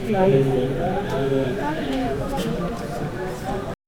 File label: distant crowd murmur; town